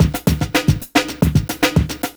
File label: fakey drummer, drum loops